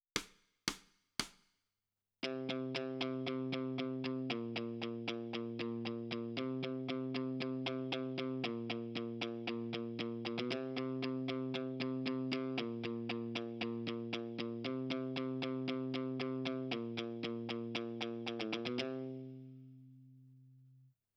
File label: Guitar Right